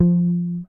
P BASS MUTES F3

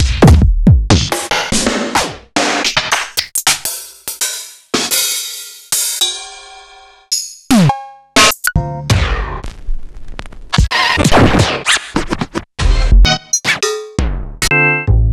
Kit; proteusvx

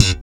POP F#, bass; Spectrasonics; Bass Legends CD 1

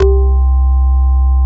Korg CX3, C 1

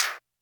Claps, Elektron MachineDrum SPS1 MKII

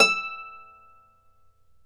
Celtic Harp, Plucked Strings

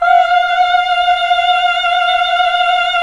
Nuns Hmmhh; Sound & Vision - Gigapack II

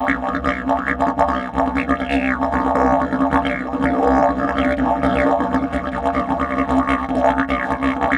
C BURBLE, Didgeridoo; Big Fish Audio - Didgeridoo and Other Primitive Instruments; BURBLDRONE

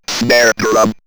Robot Snare Drum, robot vox; X-Static Goldmine

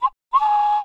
WildFX, African; Quazibeat; African Secret; SFX

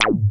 wasp sting; Masterbits; Vintage Voltage; SEQ-FX

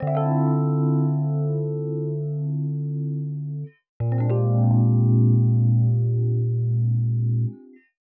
120 Rhodes Chords D, Keys, Nu Jazz House; PowerFX

